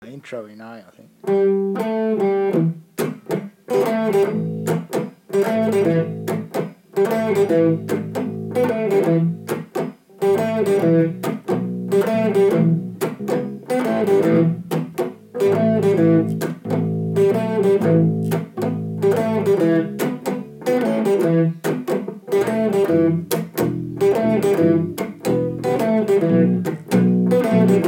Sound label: Blues Intro In A; Annoying